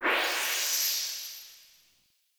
Spawn Machine Item Disintegrate; Echoes; Warframe; Entrati

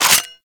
Heavy Shotgun Cock Stop; Warframe; Tenno

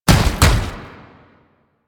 Gallop, Foley; Enemies; Warframe; Proto Infested; Babau

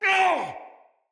Pain, Soldier Male; Battle; Barks; Dialog; Dendrit

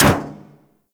Ship Hit; RailJack Hit Effects

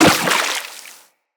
Operator Walk Water, Footsteps

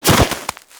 Bird Of Prey Bodyfall Snow; Hunting Critters

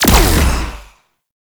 Space Figter Fire; Warframe; RailJack; Corpus; Space Fighter Gox Agent

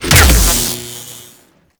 Shocker Explosion; Warframe; Corpus; Corpus Railjack; Spec Ops Shocker

